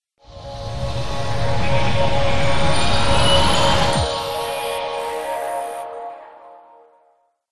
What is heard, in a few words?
Samsung Galaxy S3 Startup